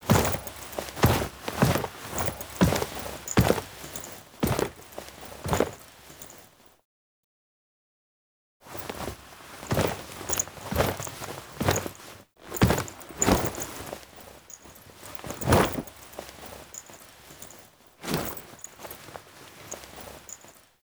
Foley; Narmer Outpost; Drifter

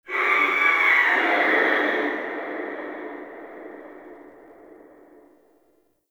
Stadium Infested Far

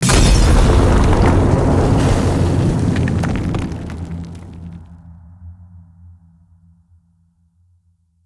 Circade Drunken Flame Slam Burst, Enemy Abilities

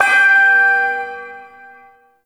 Shawzin Oct Two G; Warframe; Duviri Ersatz Shawzin

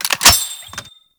Glass Shotgun Reload A; Warframe; Tenno

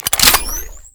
Rifle Reload; Warframe; Tenno; RailJack

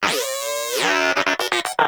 Moa Inquisitive Vocal Player Down; Warframe

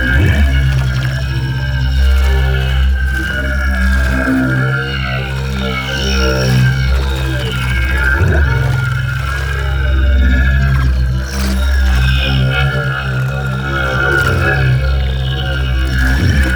Mushroom Hum Octave Loop; Ambience; Props; Warframe; Nokko Colony

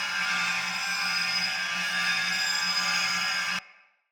Sphere Polearm Recall Loop, Weapons Player

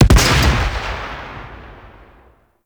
weapon punch cannon; Heavy Weapons; Warframe; Weapon Punch Layers; Weapon Punch Arch Cannon A

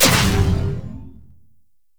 electricity hazard pulse; Gameplay; Entrati; Vaults

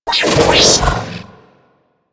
Auto Turret Agent Open; Warframe; Venus Turrets

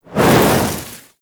Look Whoosh; Gameplay; Ballas; Warframe; Chimera Prologue

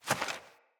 Fawn Step; Encounters; Animal Rescue

